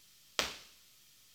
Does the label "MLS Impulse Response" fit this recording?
Yes